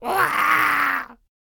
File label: Scarecrow Death Vocals